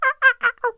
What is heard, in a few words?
Monkey Idle